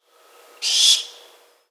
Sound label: Barn Owl Shriek juvenile